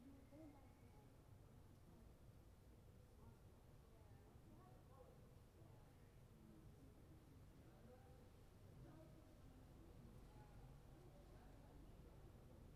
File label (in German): Regen auf Fensterbaenken; 6 Kanal